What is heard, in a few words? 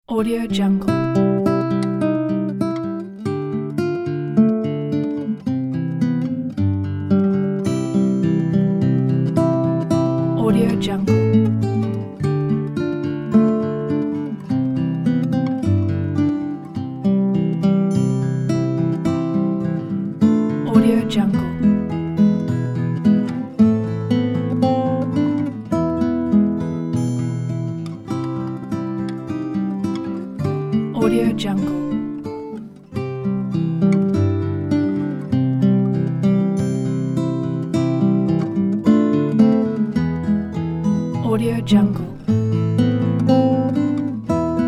guitar christmas pack